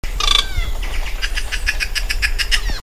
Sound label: Cyanocorax chrysops